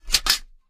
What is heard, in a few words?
Equip; Dr. Driving 2; Android